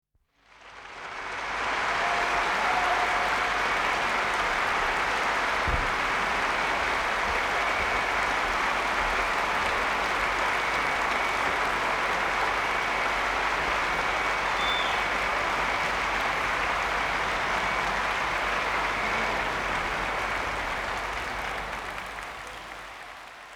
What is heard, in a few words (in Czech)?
Potlesk